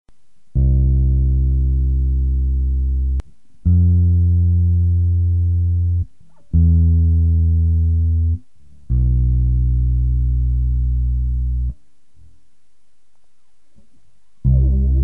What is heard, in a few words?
Flanger; bass